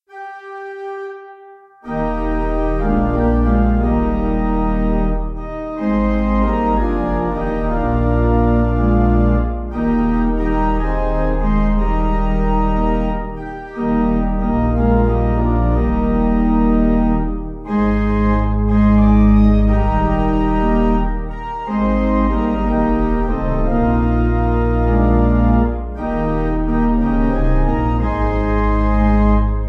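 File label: Pipe, Free Music Backing Files for Churches; Organ; Lord I Will Love You; 主，我願愛你; Geshun Ma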